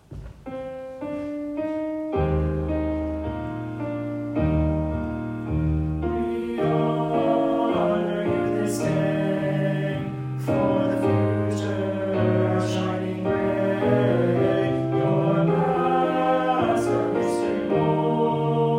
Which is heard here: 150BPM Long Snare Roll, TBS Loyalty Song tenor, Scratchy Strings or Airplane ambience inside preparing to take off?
TBS Loyalty Song tenor